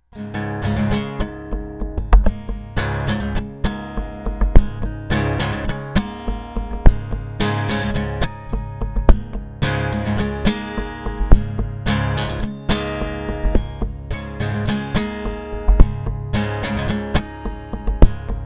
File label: percussive